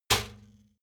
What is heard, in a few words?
trash basket metal hit